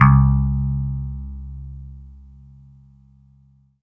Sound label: THUNDER G3; Greytsounds; Scott Peers Sound Engineering